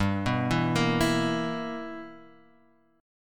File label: B Major 7th Suspended 4th Sharp 5th G